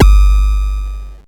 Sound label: BD, Mutable Instruments Anushri